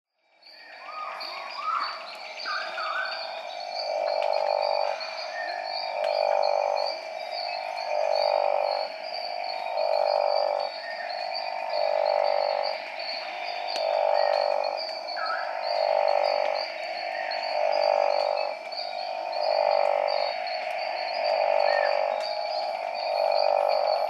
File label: Proceratophrys boiei